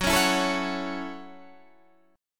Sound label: F sharp Minor 7th F sharp